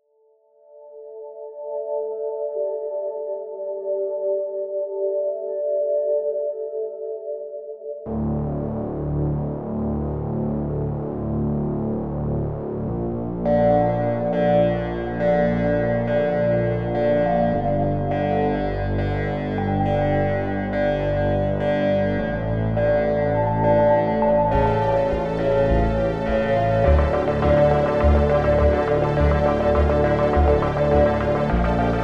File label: electronic